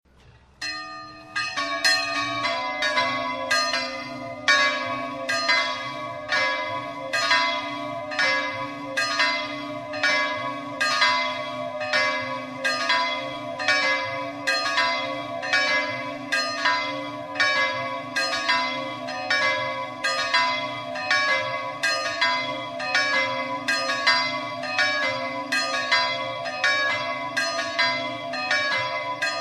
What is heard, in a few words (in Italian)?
ZELLINA, campane